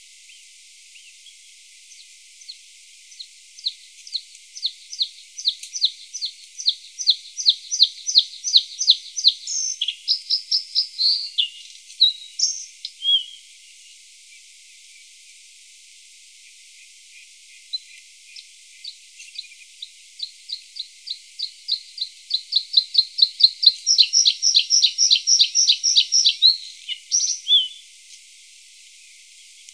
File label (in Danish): Blaahals playback; Biodiversitet